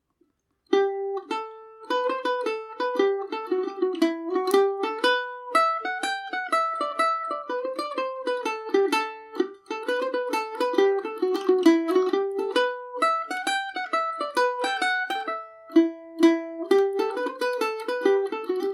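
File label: E minor no repeat, Irish mandolin; rights of man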